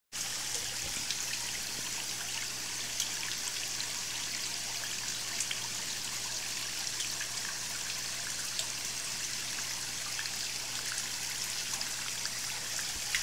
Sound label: Indoor Waterfall